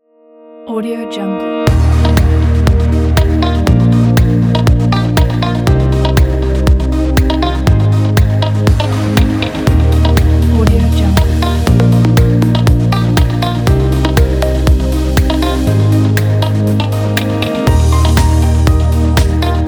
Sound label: vlog background